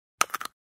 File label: fights